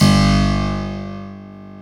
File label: KEY HRPCR; Roland - LA Composer vol. 1